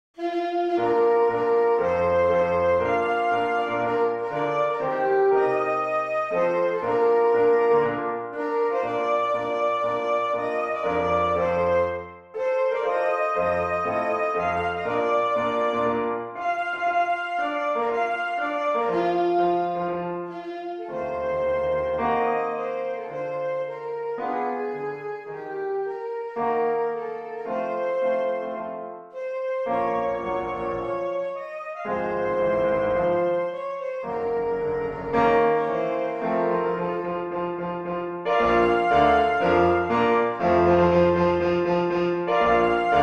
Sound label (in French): hymne national francais; rouget de lisle claude joseph